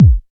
clear kick drum sample b key, Royality free steel kick drum sample tuned to the B note